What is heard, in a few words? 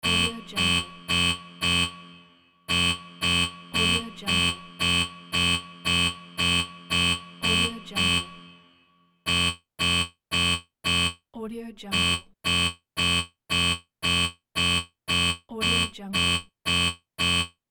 warning alarm; andrewsound83